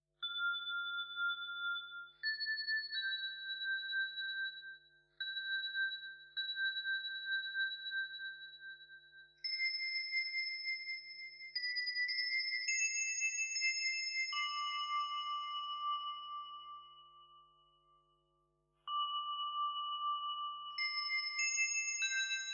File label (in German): Glocken Tanz im Hambacher Wald; WachtraumReihe